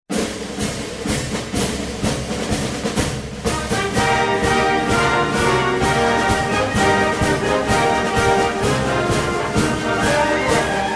Patriotic The Marines Hymn

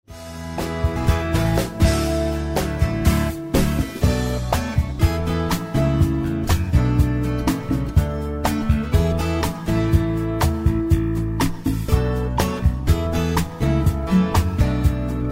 Light Contemporary

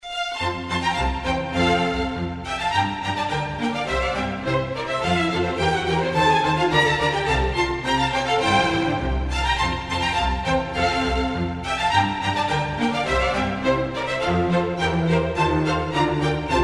Classical

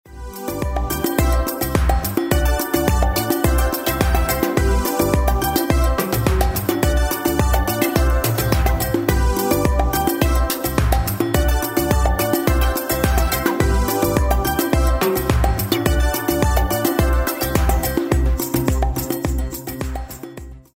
Island Sunshine Waves

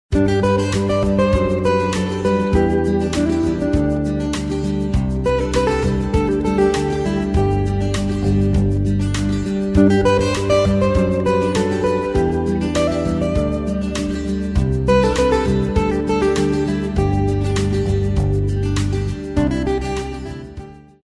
Light Contemporary

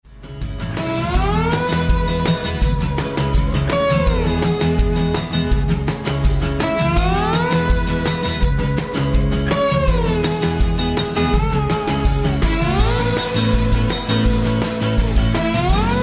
Country Americana

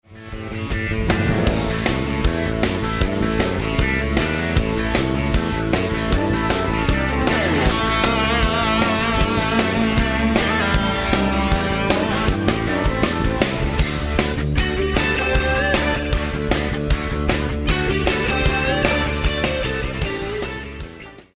Rock

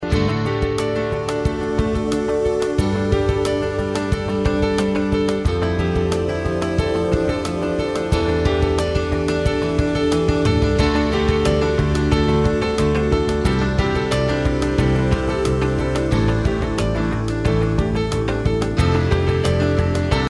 Corporate

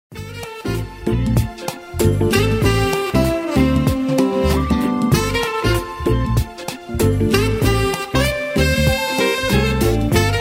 Smooth Jazz

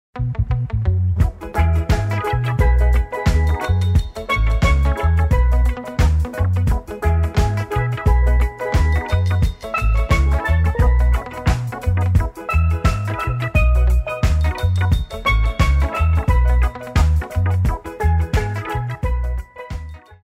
Island Reggae Roots

